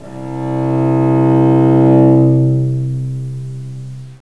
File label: cello